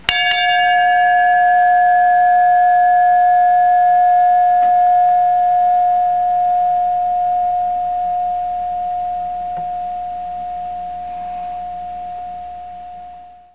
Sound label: firetruck